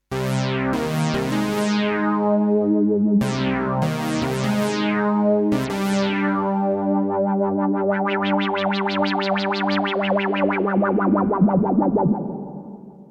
Roland JX 08

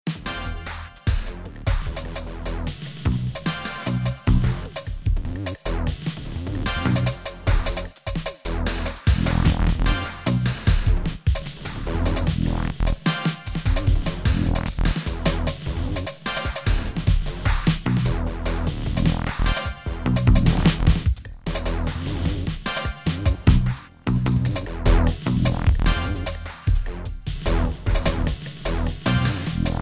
NI Battery 4 disco neu kit

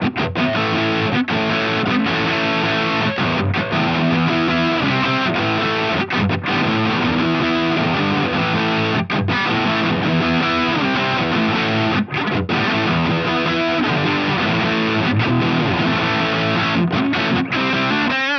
Mooer GE300 Lite Plexi

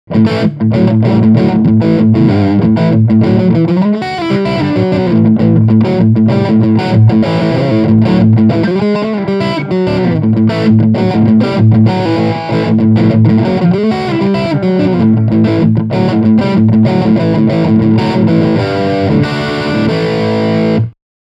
Blues Riff Mid Gain

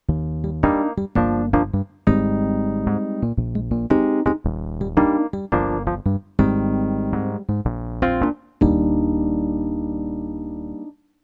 Thomann DP-28 Plus Vintage E-Piano

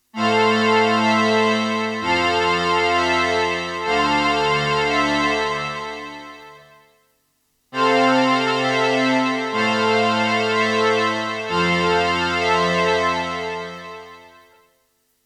Juno 60 Chorus Strings; Dimension D

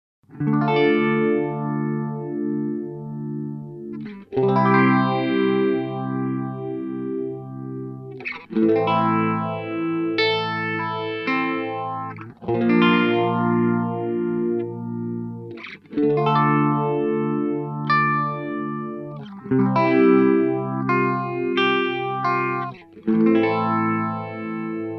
Phaser Sound A

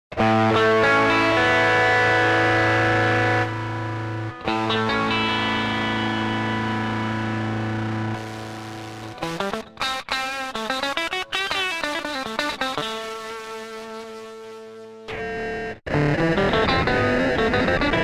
Strat Dist 8 Bit Crush

